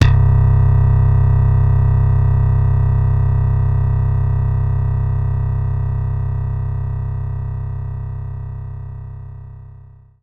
Slap Bass C2

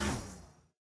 Dark Army Blazing Mage Attack Loop end; Kingdom Rush Vengeance Tower Defense

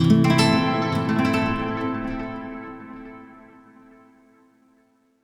Oneshot Acoustic Guitar; zombie army 4 dead war ps4 stadia switch windows xbox one gamerip 2020

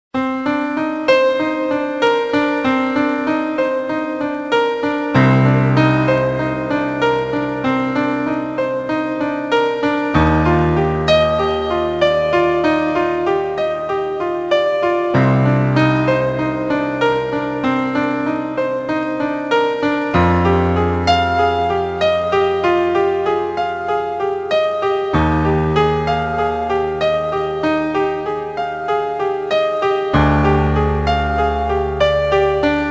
Piano Theme; Blade Runner

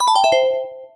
SFX - UI Game Paused; Mario Kart 8

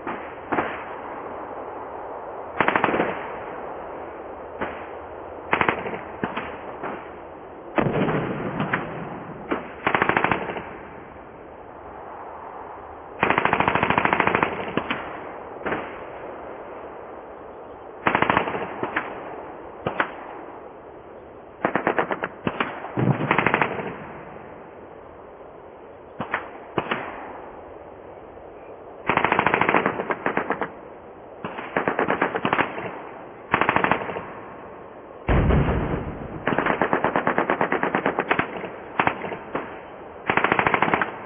Snowy Ambient Ext

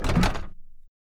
metal door

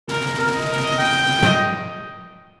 Battle Trumpet Jingle; gamerip; Call of Juarez Bound in Blood

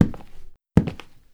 Footsteps; Welcome to the Game II

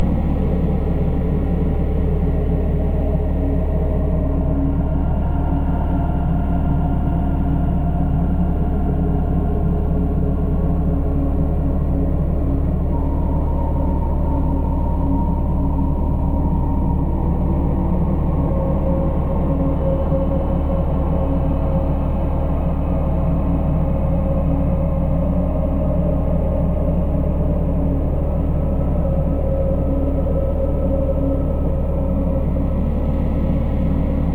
Ambience Catacombs

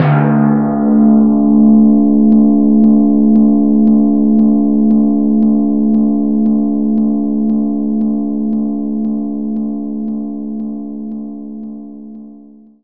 Timpani Soft

